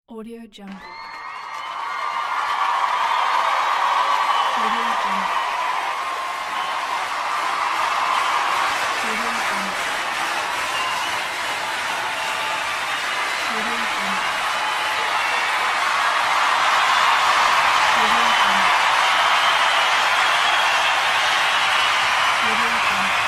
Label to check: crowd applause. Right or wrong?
Right